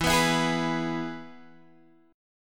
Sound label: E Major guitar chord, Drop D